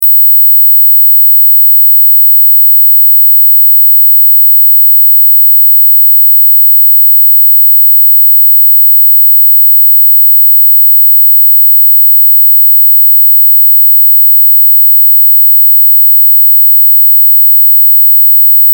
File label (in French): Sonnerie anti-jeune Moustique